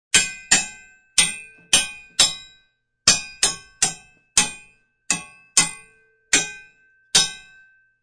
Two pieces of metal banged together such as plumbing pipes or large blades.; Metal Banging; Bangs